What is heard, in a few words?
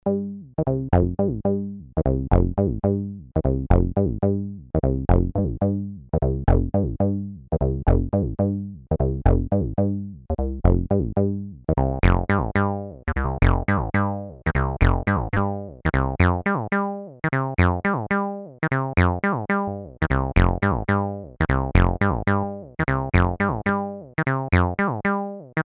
TD 3 MO VCO plus SubOsc